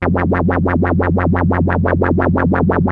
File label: ARP 2600 D#3, synthesizer; Hollywood Edge - Giorgio Moroder Rare Synthesizer Collection